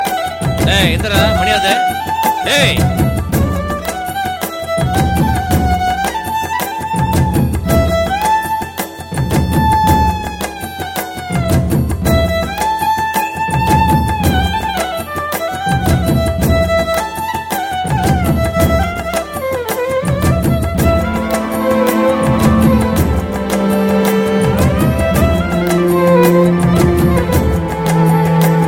Violin; Mugamoodi

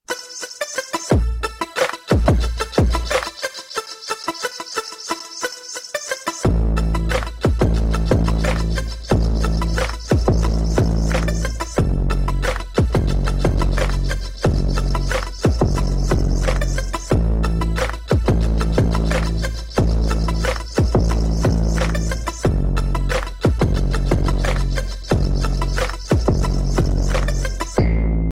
Dynamic Bass

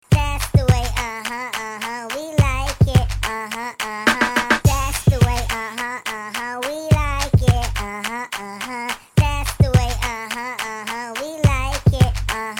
Club

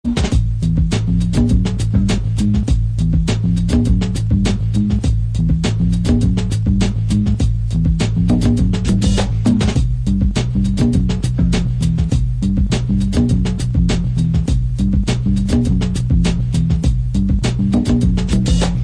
Groovy Beat

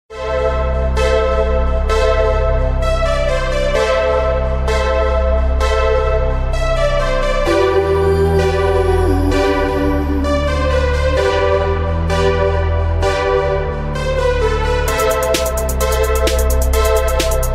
Freestyle break beat

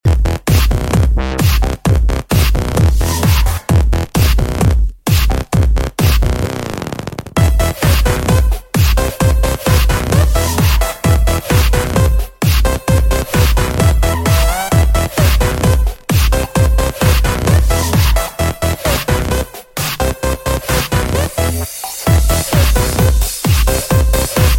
Tommy Ringtone

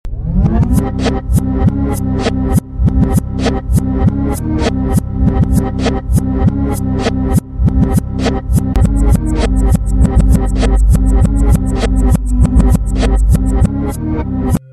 Win Music

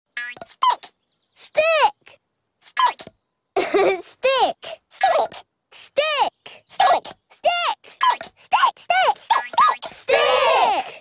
Stick Message Tone

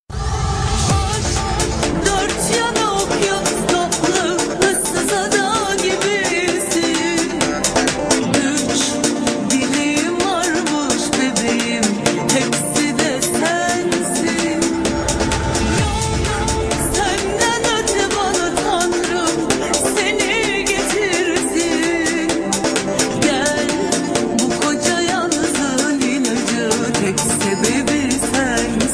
Turkish song

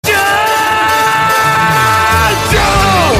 Yell; Jo Jo

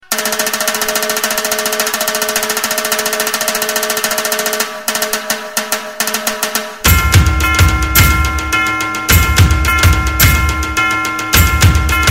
Nashik Dhol Arti

